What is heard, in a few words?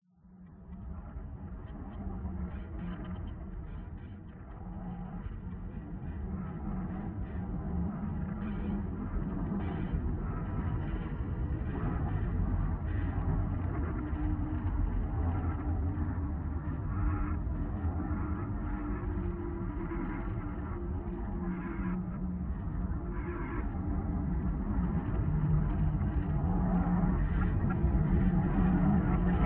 Pad Darknoise